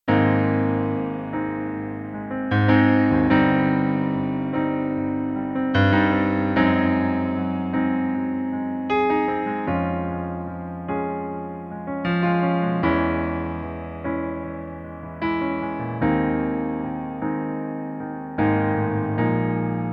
Pianoplayback Ab-Dur

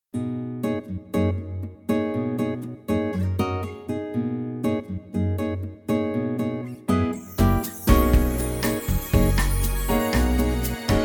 vollinstrumentiertes Playback Bossa Nova H; H-Dur

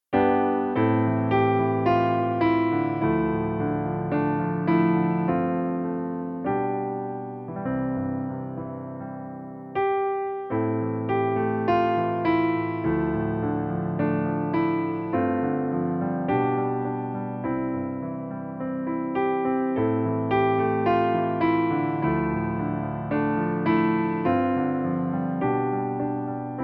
Pianoplayback C-Dur

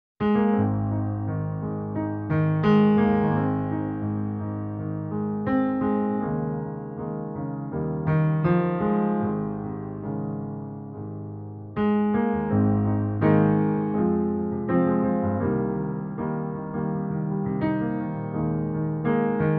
Pianoplayback sängerin G#-Dur

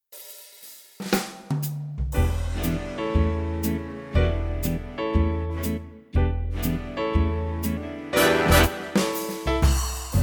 vollinstrumentiertes Playback ClassicBigBand e-moll

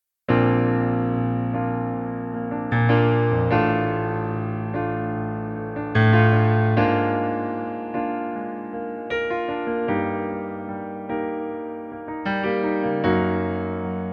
Pianoplayback Bb-Dur

